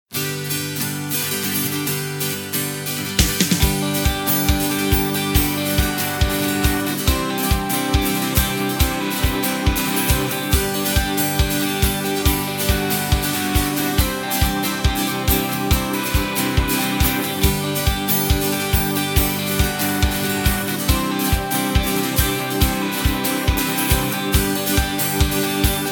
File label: (G) Live No Backing Vocals